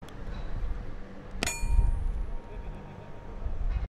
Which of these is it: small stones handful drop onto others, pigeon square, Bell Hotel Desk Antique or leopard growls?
pigeon square